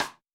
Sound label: Rim